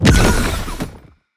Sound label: spiders heroe tunneling out